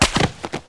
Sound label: fishing catch fail; MiniGames